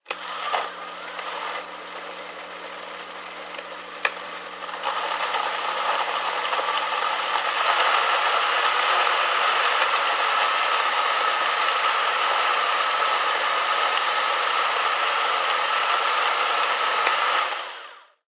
Blender Machine Run; appliances

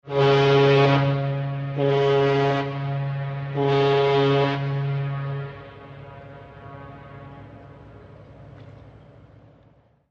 Loud Distant Boat Hor, boat horns

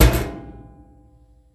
Clothes Washer Slam

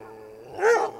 Dog Growl To Bark

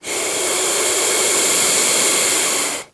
Lizard Water Monitor

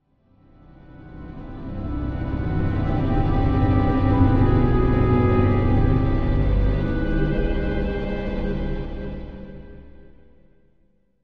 Music Eerie Orchest; orchestral

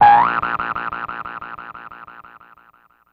Boing Sustain

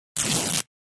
Fabric Tear Rip

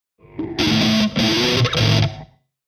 Elect Guitar Hvy Rhyt, electric guitar

